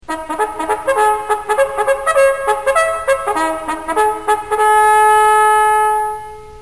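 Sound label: bugle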